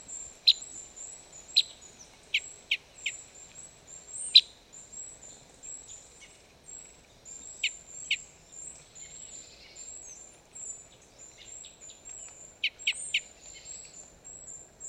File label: Red Crossbill Type N07 - adult bird FCs and ECs